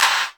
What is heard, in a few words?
Clap n Snap